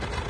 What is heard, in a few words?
diesel